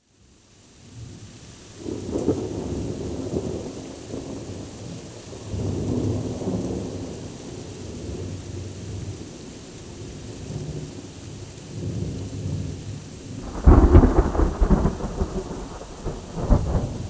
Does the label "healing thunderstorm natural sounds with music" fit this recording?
Yes